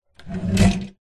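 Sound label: household bathroom medicine drawer open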